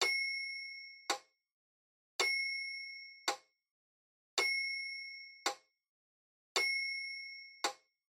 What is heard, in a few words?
Metronome High Bell Click Slow